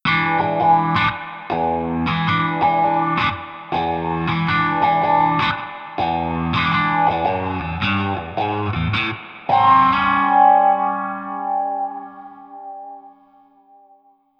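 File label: Blues Guitar; Ending; Digidesign; Pro Tools Essential Loops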